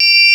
DIGITONE; Pro-Rec - Synthsonic

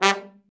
TROMB; Trombone

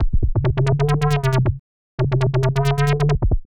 136bpm Lines n Loops Synths, uk garage samples

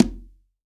CONGA, STEREO CONGA

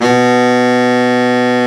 BARITON SAX

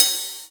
Hi Hat Open

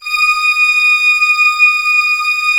ORCH A5 FF, Orchestra; Sound On Sound; Peter Siedleczek's Orchestra

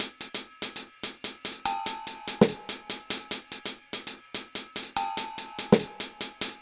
145 Jam Hats, 145bpm, Nu Dance Electro; Zero-G; Soundclash; Combi Loops; Inline Jam

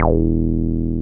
303 RES E2F, RES BASS

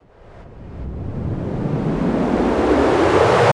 rusher

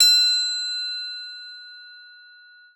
TRIA; Xsample VOL-9

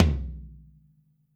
FLR TOM MOD; Q Up Arts; Denny Jaeger Private Collection

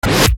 Bass Dr, EuroDance Bdrums

